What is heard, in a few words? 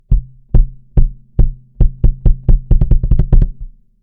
kick; BASSROOM